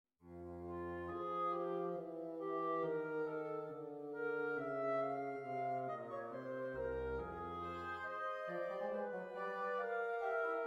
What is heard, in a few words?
Sinfonia No. 9 in F Minor; BWV 795